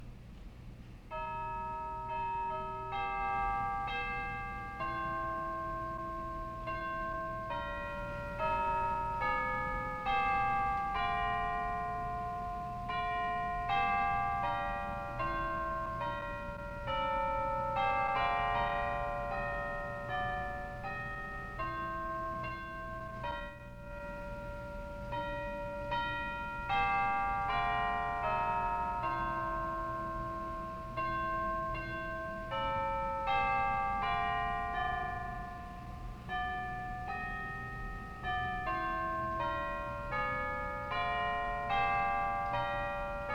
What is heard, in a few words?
Deagan Chimes; Auditorium of South Norfolk Baptist Church, Chesapeake, Virginia